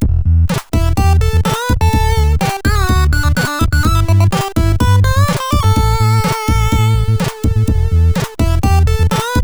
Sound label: Guitar Test, sid, CSG8580R5